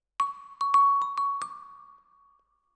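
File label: Vibraphone Close, Jazz for piano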